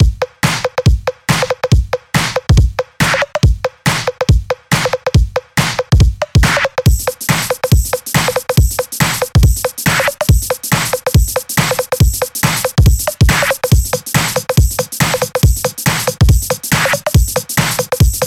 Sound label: Disco Groove Combo